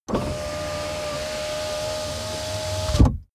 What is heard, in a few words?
car window back close full